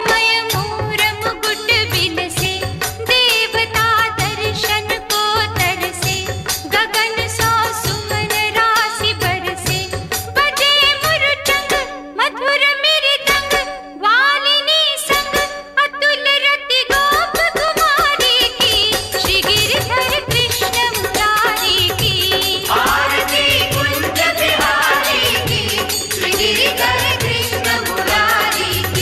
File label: Krishna Aarti